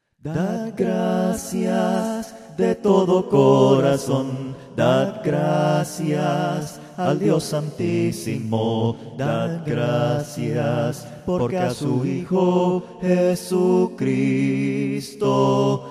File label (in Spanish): melodia palabras